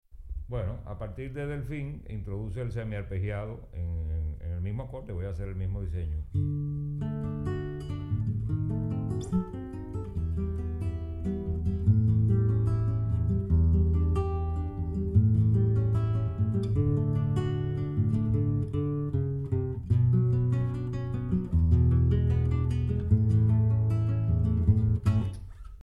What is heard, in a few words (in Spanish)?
semi-arpegiado